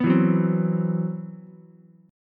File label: C Major 7th Flat 5th, Ukulele, chord; CM7b5 strummed; 4,4,2,3; JGuitar